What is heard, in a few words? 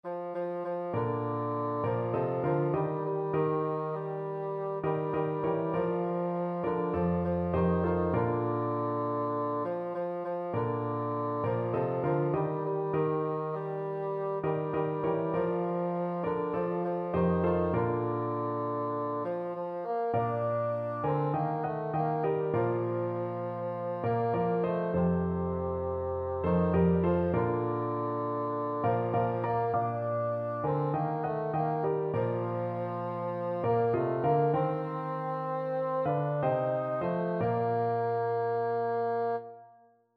how great thou art, bassoon